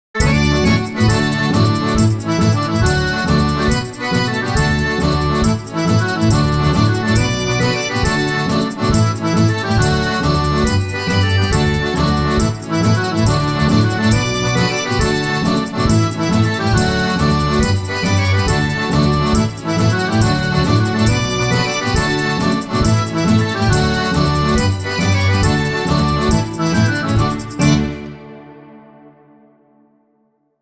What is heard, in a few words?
Music Bagpipes Tutti; Curse of the Sea Rats